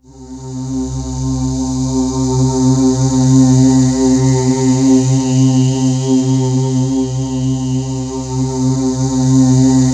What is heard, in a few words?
C4, Synth Leads